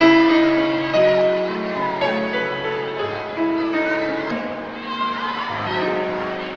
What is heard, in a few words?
piano uncut sadness, sad loop; Loops